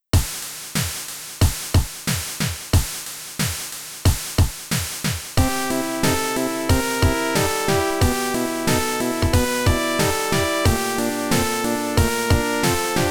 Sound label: Internal Percussion